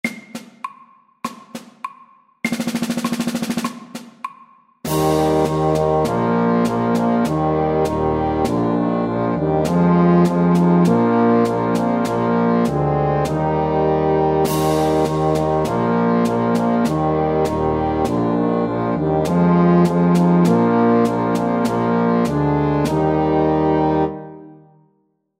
Elefantmarsj FAST 100

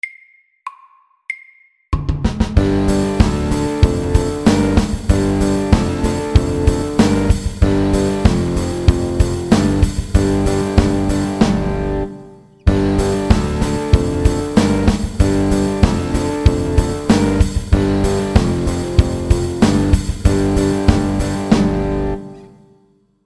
Mountain rock MEDIUM 95

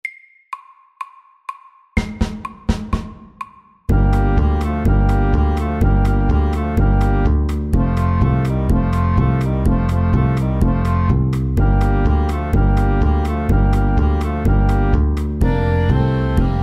Balkan stomp MEDIUM 125

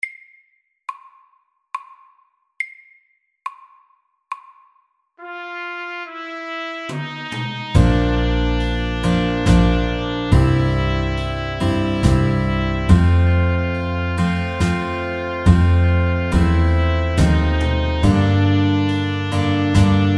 Ballade SLOW 70

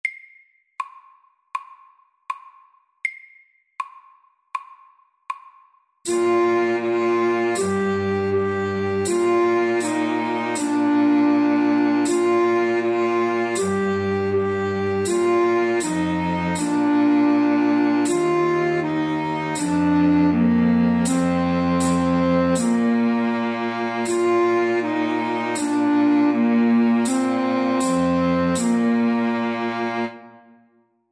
Folketone SLOW 80

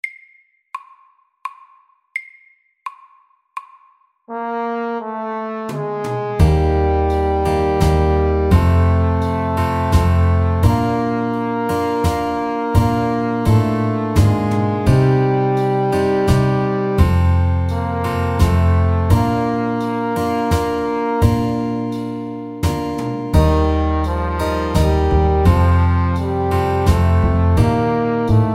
Ballade, 85

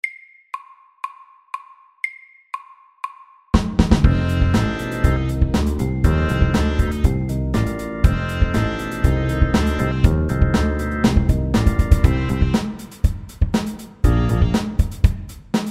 8 bar blues

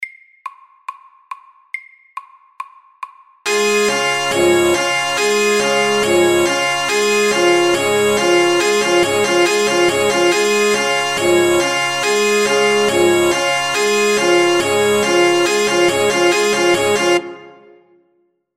Alarm FORT

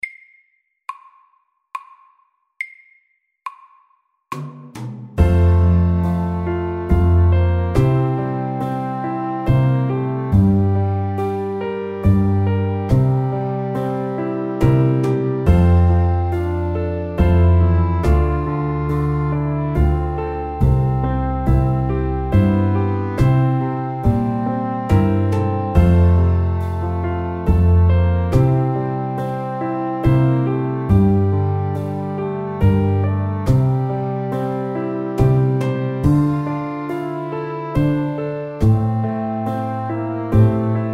Soft rock SLOW

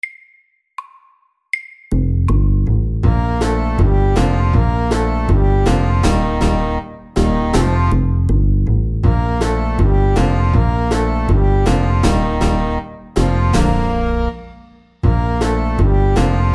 Line dance SLOW 80

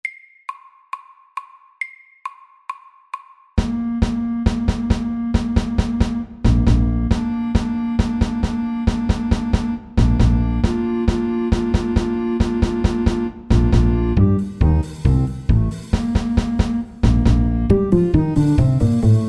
Football match FAST 136

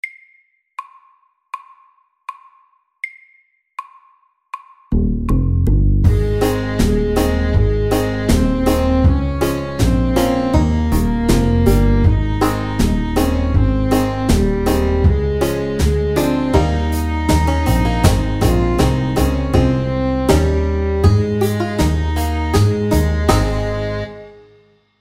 SLOW 80; Den glade grisen

